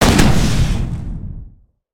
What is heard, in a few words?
Flare Gun